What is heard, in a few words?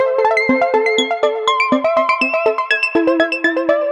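122 BPM Sequence A major G minor Tonic D; innovative sequences 1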